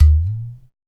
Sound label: UDU, African Contemporary; Supreme Beats; Spectrasonics; SB Udus